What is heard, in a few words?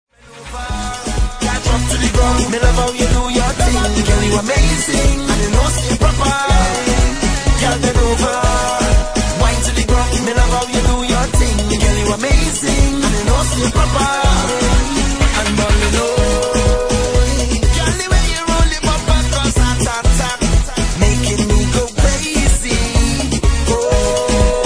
soca